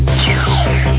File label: woosh